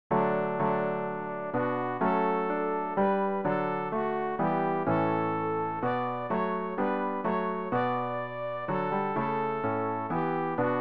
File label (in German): sopran; Ref. Gesangbuch; RG 468: Wir wollen alle fröhlich sein